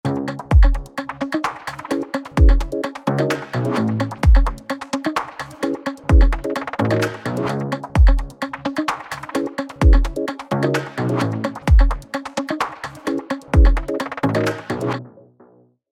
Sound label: 129 Disco loop Cm WET